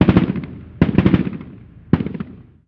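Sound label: gun us assault medium; ModernWarfare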